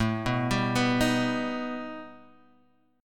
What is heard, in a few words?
B Augmented 7th A